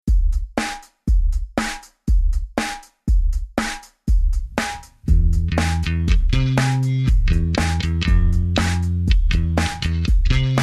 freqbox, moog, bass

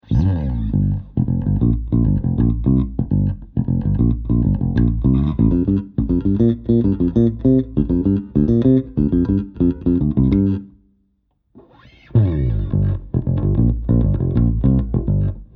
bass only

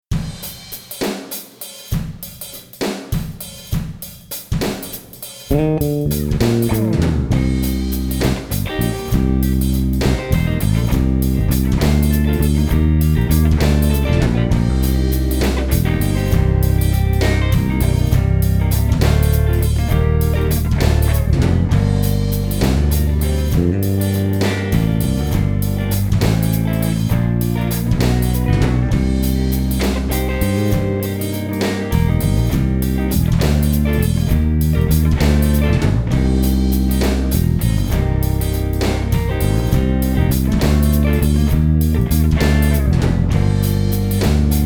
BASS RGTR ONLY; roscoe 5995

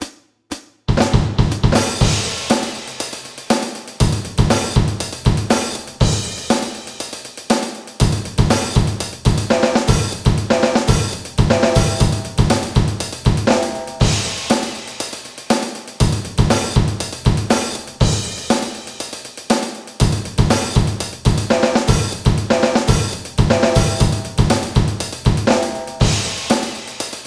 drums only

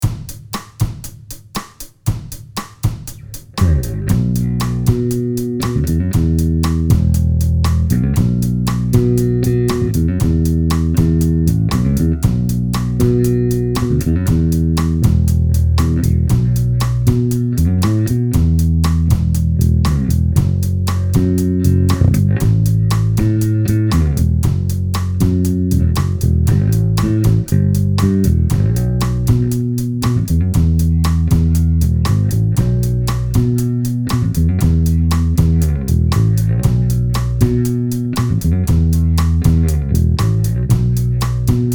drum bass tracks; ae 212 cabs; berg ae112

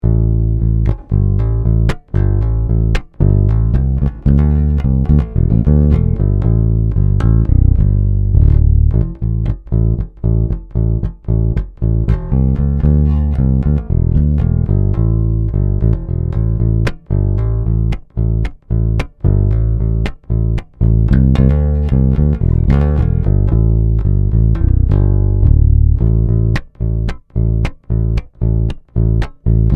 direct bass; roscoe century standard plus 6 j koa

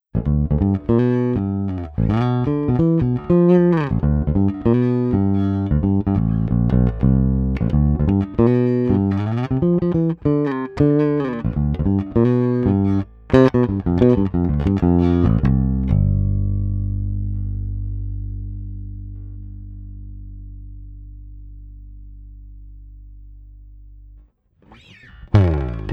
BASS ONLY; direct recording signal chains comparison

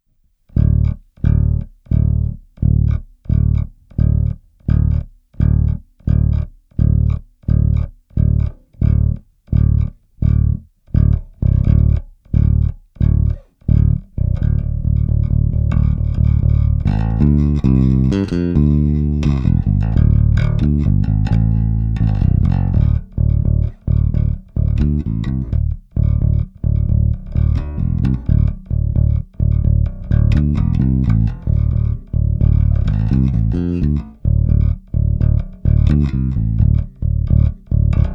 Bass

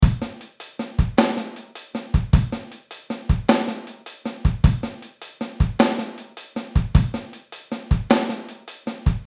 Purdie shuffle

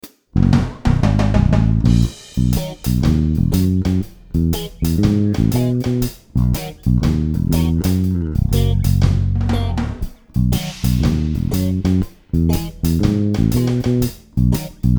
reggae rhythm section

